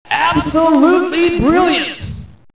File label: hurray